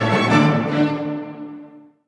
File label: finale, Classical Harmonies, notifications; Google; Google Sounds